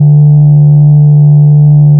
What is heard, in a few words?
fm cello single; FFTimbre